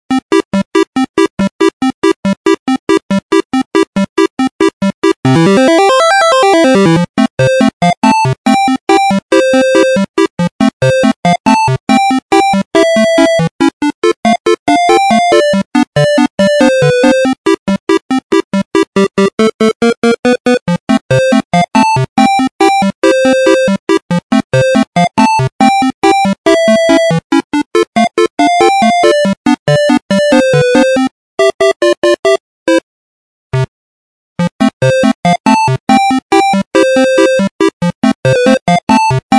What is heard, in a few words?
Chiptune Overload